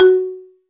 TUNED PERC; Greytsounds; EXOTIC PERC

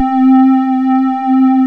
SYN SQRS, JV 1080; Pro-Rec - Synthsonic

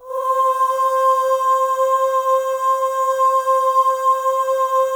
OH, M+F; Spectrasonics; Symphony of Voices; M+F OHS

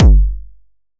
kick drive

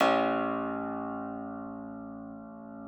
piano A, Thumb Tack Piano ff; Best Service ProSamples vol.53 - Classical Prepared Pianos; Total Piano

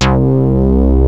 KLUNK; Rubber Chicken Software Sample Bank

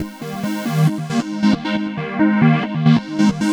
NRG Arp, trance

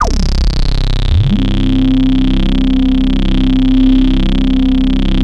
EFFECT, Explosive Jungle

